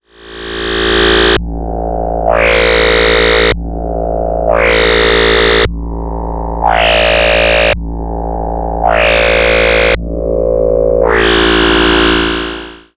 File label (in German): MOOG Mavis FOLDed Waveform EG und LFO steuern PWM